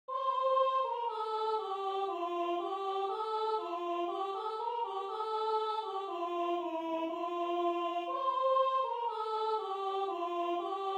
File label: Soprano; Deck The Halls